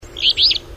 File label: Alder Flycatcher; Flycatchers